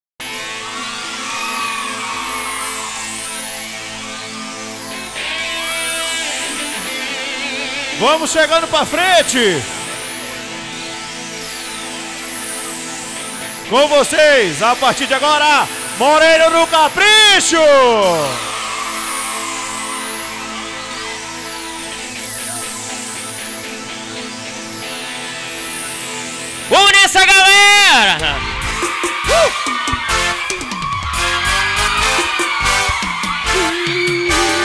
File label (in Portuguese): abertura, ao vivo; ilheus